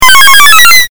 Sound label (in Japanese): 8bit 上昇